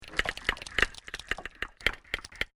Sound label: Weird Random Sounds, cartoon; Miscellaneous Cartoon Sound Effects